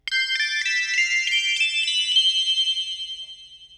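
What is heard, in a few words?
Nord Lead 4 sparkling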